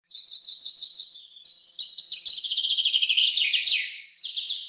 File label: bird sound